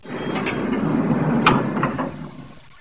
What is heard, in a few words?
elevator open, doors; gesource